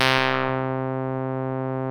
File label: saw pulse